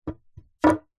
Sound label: wood slat pine set down solid ground; foley